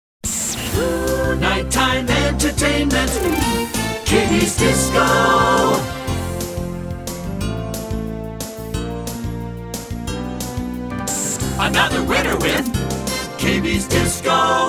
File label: Jingle; Short Attention Jingle Show IV